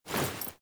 Female Foley Action Default Jump, Default Clothing; Characters; Player